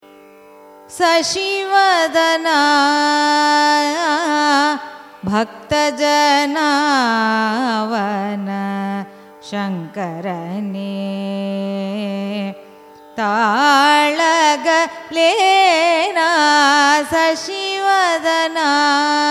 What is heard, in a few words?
raga chandrajyoti carnatic